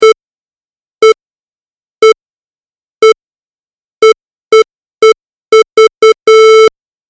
Countdown Beep Tones; Beep Sound Effects

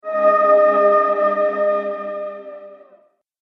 Screams Ghosts; Ghost Sound Effects; horror sound effects

